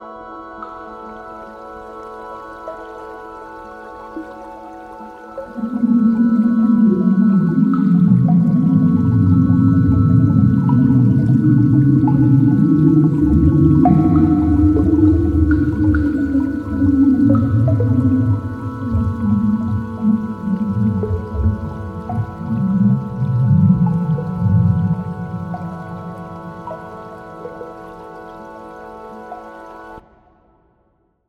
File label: sonify ss433 ir radio